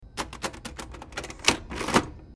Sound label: adding machine 1940s operating; burrows